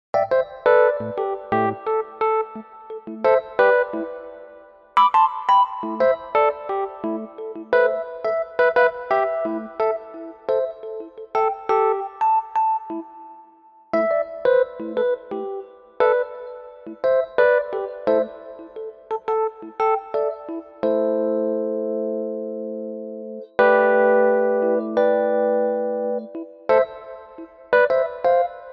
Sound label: Project Funky; admiralbob77